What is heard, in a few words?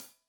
Hat Loose, Hi-Hat, Cymbals Stereo